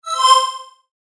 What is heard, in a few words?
correct; 遠くから走ってくるような正解音。; 正解の音・ピンポーン